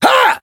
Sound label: attack